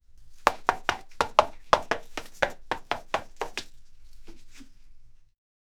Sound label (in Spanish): pasos de vaquero, western